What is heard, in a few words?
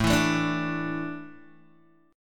A Suspended 4th, Guitar Open G